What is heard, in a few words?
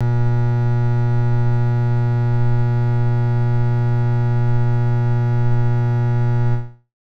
transformer, Transformer Hum; E-MU Producer Series Vol. 3 – Hollywood Sound Effects